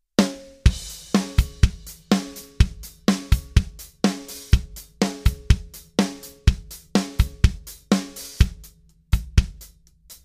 Drum Sounds

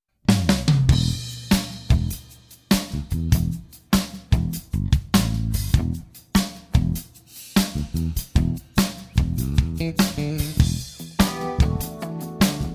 Ruby Blues triplets

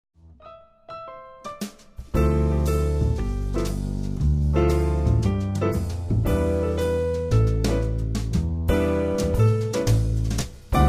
Jazz Standards

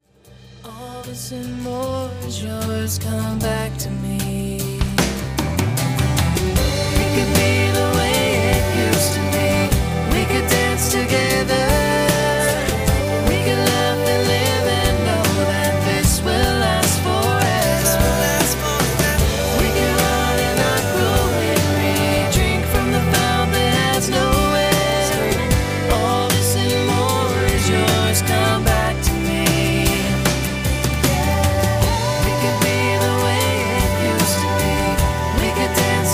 Choir Of Two

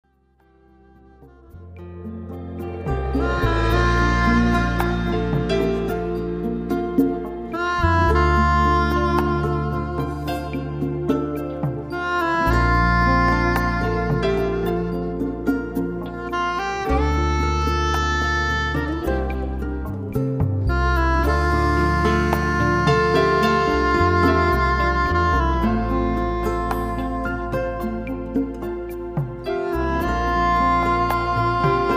Percussion Track

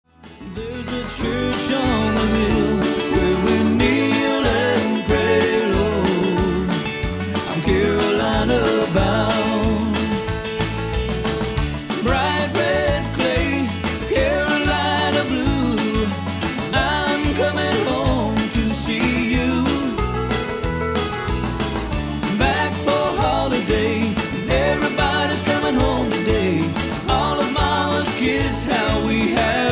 Medium Train Beat

